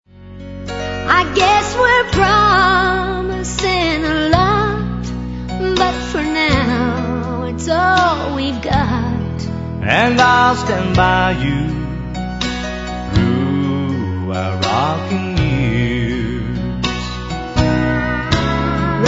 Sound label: Stationary Waltz